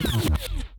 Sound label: Old Tape Rewind; knight rider